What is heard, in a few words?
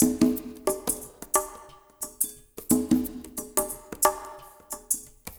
PERCMX, SWINGTOMS; Spectrasonics; Liquid Grooves 1 & 2